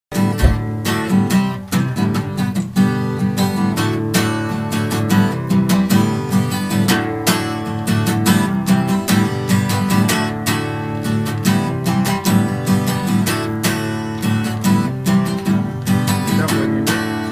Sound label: harmonica